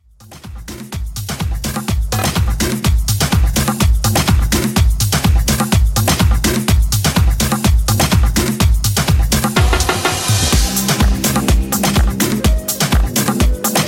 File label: Tribal House 125Bpm